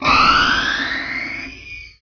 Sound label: pod slide up